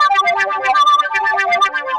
Synth

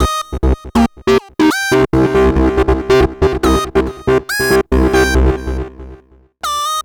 Synth Bass

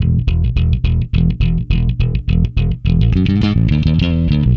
Electric Bass; Basses

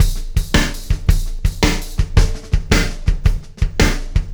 Acoustic Drumloop

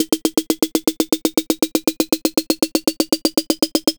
Dance Drumloop

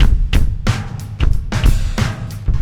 Acoustic Drumloop; Drums & Percussion

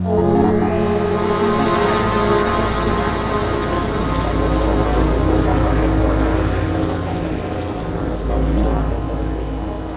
Pad

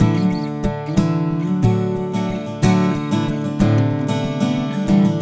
Acoustic Strum, Acoustic Guitars; Stringed Instruments

